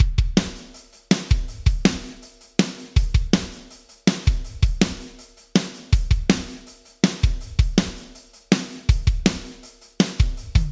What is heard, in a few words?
Drums Yamaha Rev7 Live Reference Mode 4; Vintage Digital